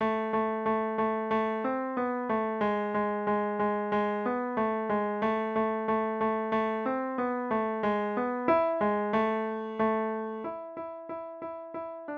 piano

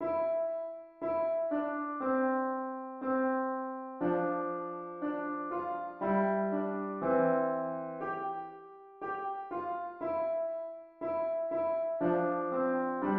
piano

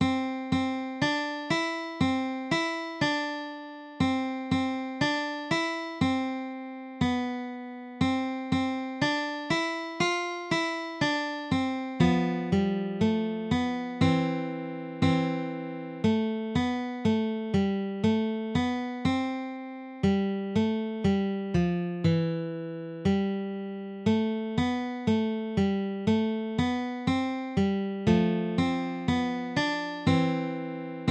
guitar

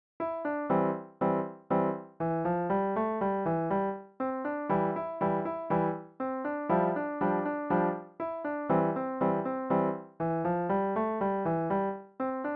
do your ears piano

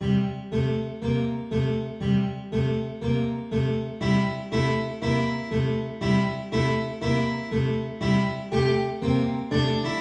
boogie guitar

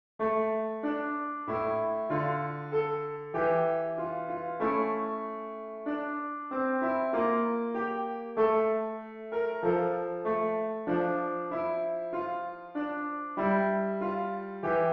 three ravens piano

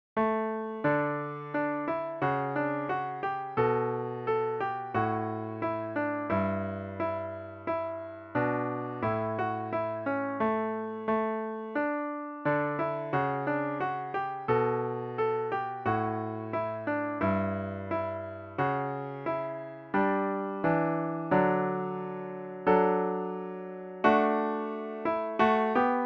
simple gifts piano solo

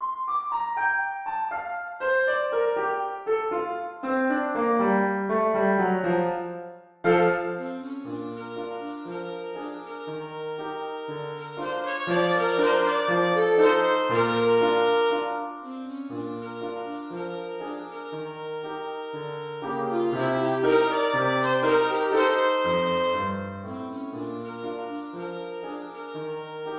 alto sax

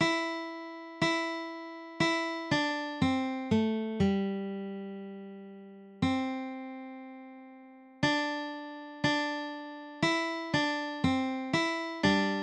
jesus loves the little children guitar

